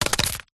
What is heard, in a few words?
foley tree bark piece drop on ground; zapsplat